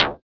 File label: FX EFF, ANALOG; Sound & Vision - Gigapack II